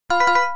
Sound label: Low Battery; LG; MU550